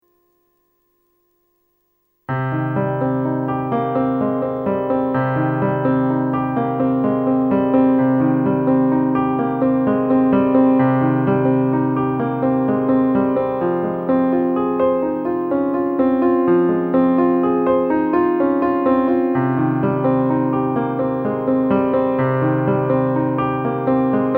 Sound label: solo piano